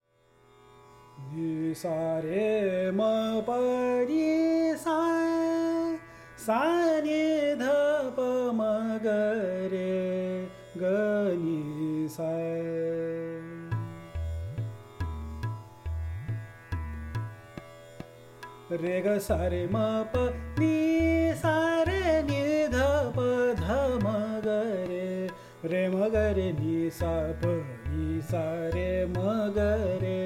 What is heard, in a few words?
Raga Des Vocal; Bansuri Student Zone